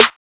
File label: Snare Double